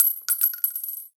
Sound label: AK47 Shell Casing; Warframe; Tenno